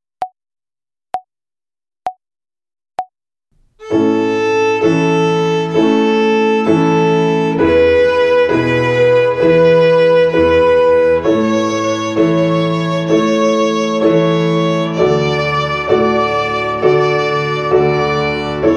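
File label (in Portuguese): Ginástica até o dedo 3 Corda LÁ Quartenária, Violino; Violino para os Pequeninos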